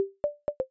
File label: start